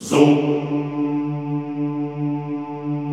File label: ZOOMMM D2, Classical Choir; Best Service; Extended Classical Choir